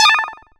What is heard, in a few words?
chime; pyrogue; Oryx